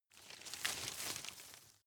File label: rustle medium; foerster